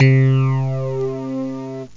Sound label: mirage bass flange; OctaMED SoundStudio V1